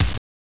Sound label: Kick, Genesis; TMNT; M8